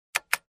Keyboard Key press slowly

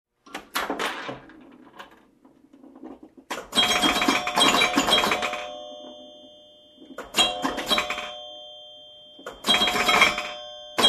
Amusement Park slot machines

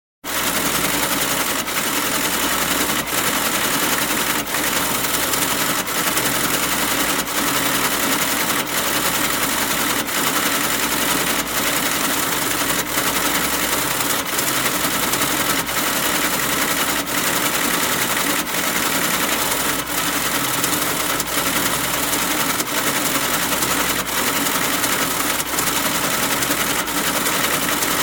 Printer Machine Running

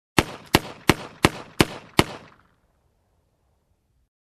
Outdoor pistol shooting

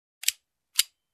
Cocking up a gun

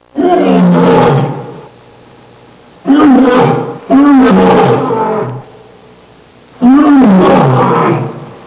Vintage lion roar

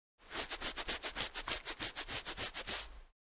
Cleaning using mini brush

